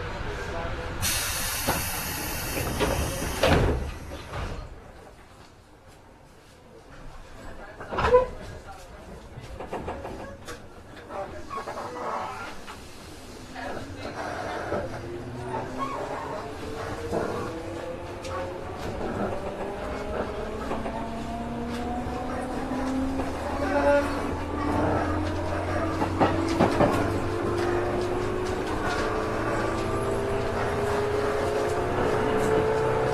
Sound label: yokohama 205, train